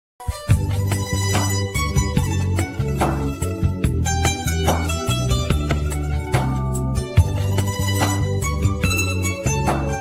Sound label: Instrumental Ringtone